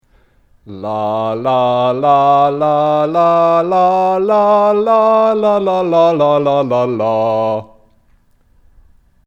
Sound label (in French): La Scale